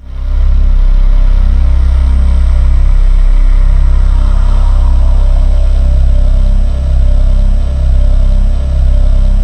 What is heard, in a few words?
PAD8 C2; Explosive Jungle; USB Soundscan vol.05; AKAI